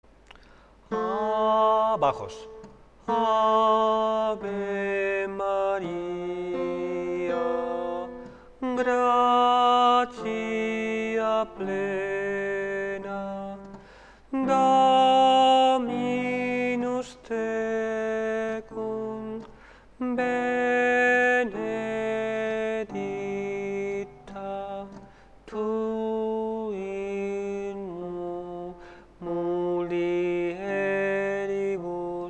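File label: bass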